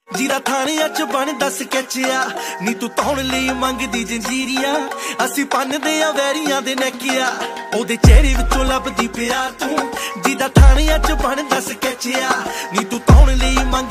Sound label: Popular Punjabi Ringtone